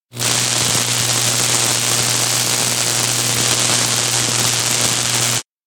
electric fence shock; anemoi

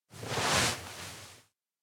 collapse; jet; anemoi パッケージ版 1.1

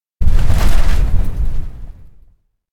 plane land rattle; anemoi

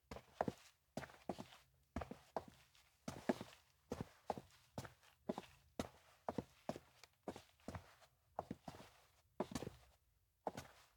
step walk; anemoi

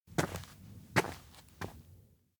steps outdoor town step out call; anemoi

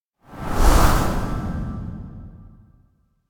elec wind flow inward; anemoi

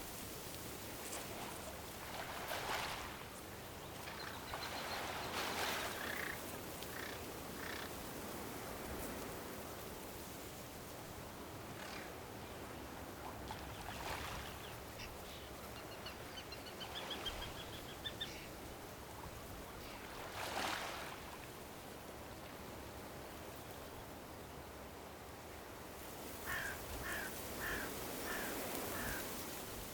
beach; anemoi

